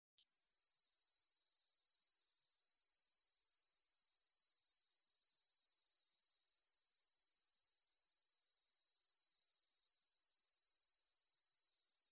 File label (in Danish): sivgræshoppe